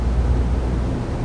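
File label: RUMBLE NOISE, ROOM NOISE; 1000fx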